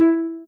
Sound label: soft hitwhistle; osu